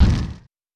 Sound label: Fire Flower; Super Smash Bros